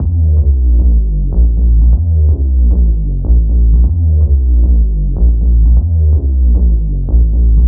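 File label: bass loop B flat 125 bpm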